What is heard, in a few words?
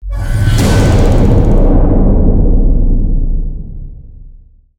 Orchestra Hit